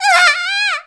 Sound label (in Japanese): uwaa, syozyo